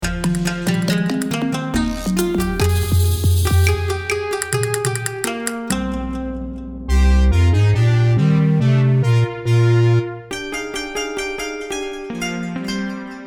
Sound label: A repetative tune fit for an quirky item shop or vendor.; bobjt